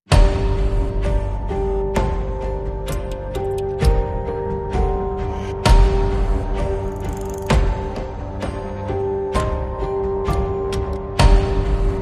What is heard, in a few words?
Foreboding, Less Guitars